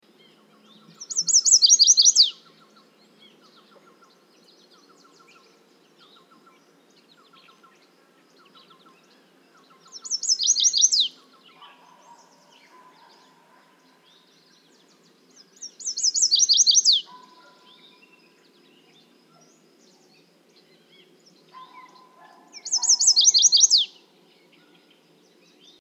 Chestnut-sided Warbler, Setophaga pensylvanica

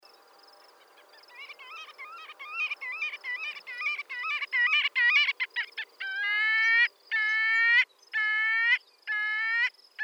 Marbled Godwit, Limosa fedoa; shorebirds rails

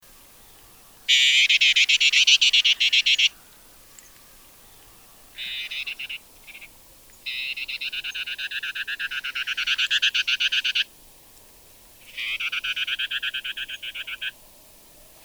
Gray Vireo, Vireo vicinior; vireos

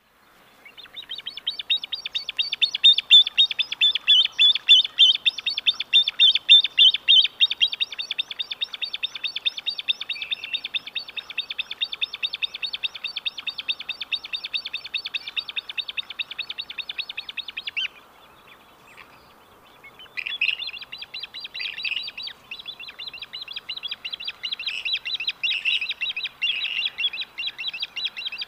Western Grebe Aechmophorus occidentalis; waterfowl

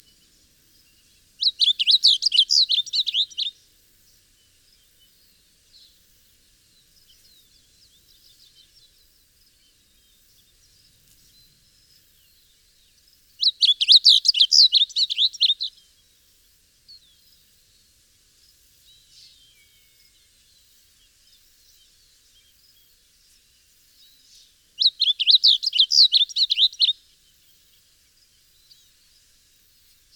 Blue Grosbeak, Passerina caerulea eurhyncha; cardinals tanagers grosbeaks

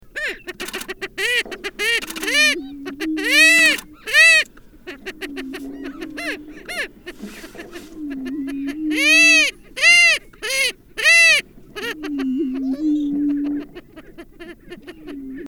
Greater Prairie Chicken, Tympanuchus cupido; gamebirds

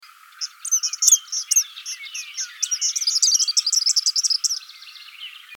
Eastern Kingbird, Tyrannus tyrannus; flycatchers

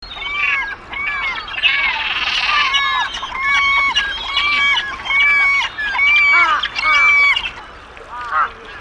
Glaucous Gull, Larus hyperboreus; gulls terns

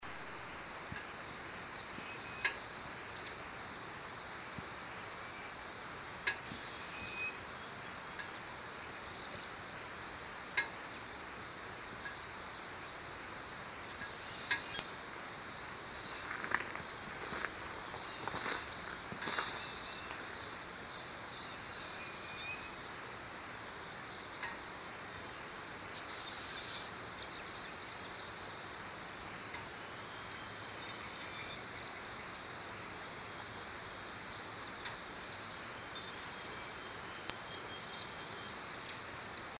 Broad-winged Hawk, Buteo platypterus; raptors

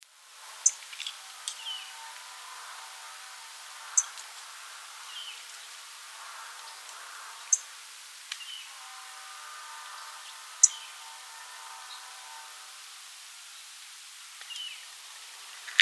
Orange-crowned Warbler, Leiothlypis celata; warblers

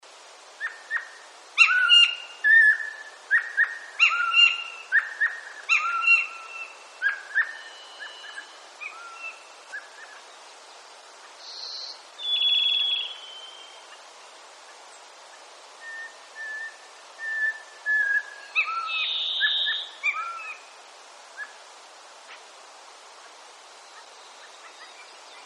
Canada Jay, Perisoreus canadensis; jays and crows

